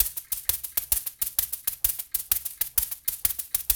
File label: 130 Hawaiian, World Dance Beats; Spectrasonics - Supreme Beats